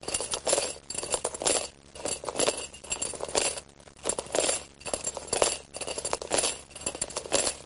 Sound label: Chains Rattling; haunted sounds